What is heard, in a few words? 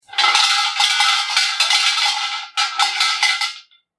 garagara